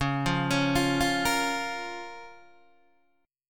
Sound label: Db 6th, Guitar, DADGAD